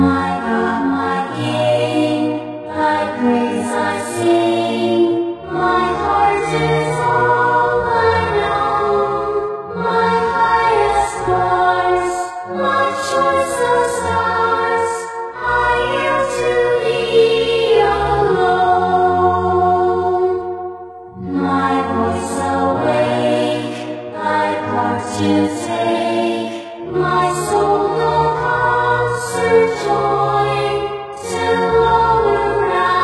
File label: choir, great amen